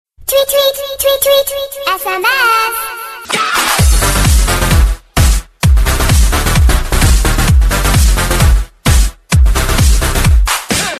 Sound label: techno ringtone